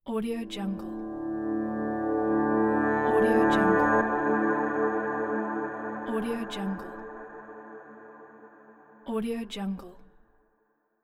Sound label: ambient transition; bogdansfx